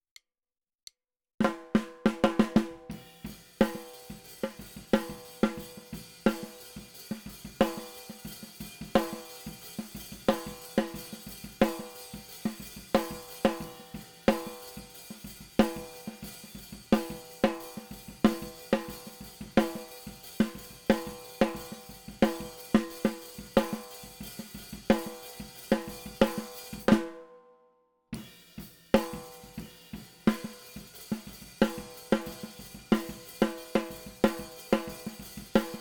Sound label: SNR